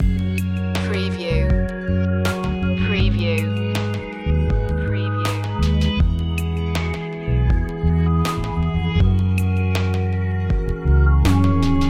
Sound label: ambient dream loop